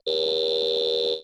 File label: Button Buzzes Robot C